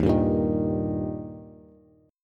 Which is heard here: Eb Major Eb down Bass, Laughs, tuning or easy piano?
Eb Major Eb down Bass